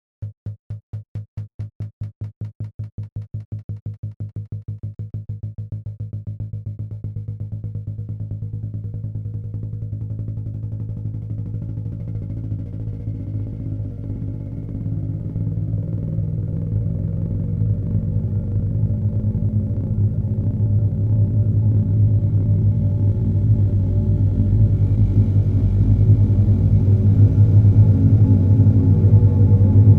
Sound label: Cello B